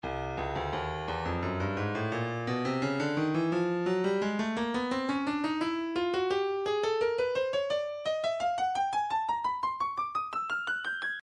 breakbeat 086 bpm; Stylus Breakbeat Midi Files

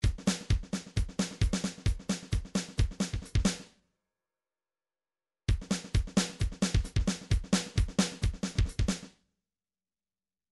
drums, midi; Paul Kodish Dangerous Drums

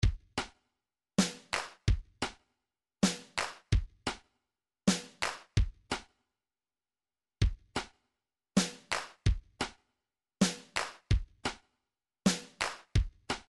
130 cowbell, midi

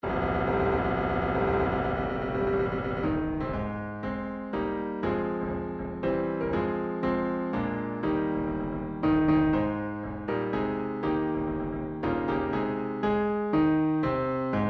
classical anthem, midi

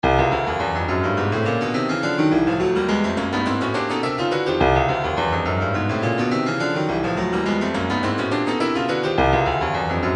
soul fire perc; retro funk; Retro Funk Disc B

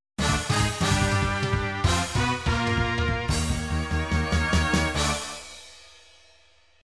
game over; super nintendo gundam wing

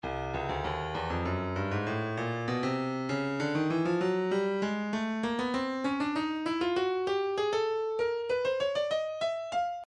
breakbeat 098 bpm; two tone a; Stylus Breakbeat Midi Files

